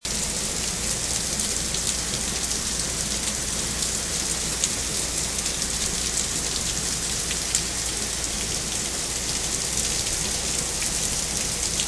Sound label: thunderstorm